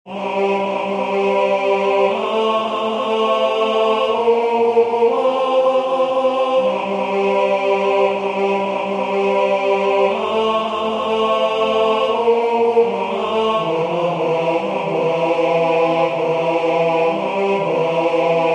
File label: Intro tenor